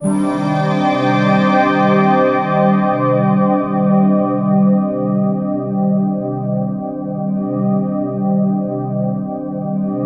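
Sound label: soundtrack, ambient atmospheres; USB Soundscan vol.13; Ethereal Atmosphere; AKAI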